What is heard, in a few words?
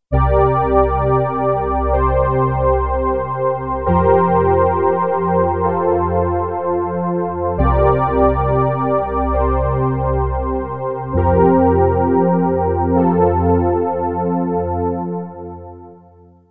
Lush Pad